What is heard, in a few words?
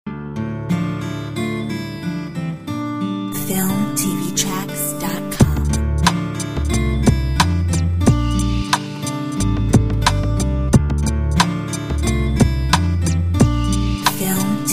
Ultimate RNB